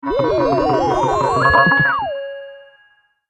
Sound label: Cartoon Amazing Vibrating Spell, Magic Sound Effects